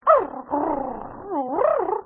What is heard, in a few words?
dino cave small dinosaur; Quicksilver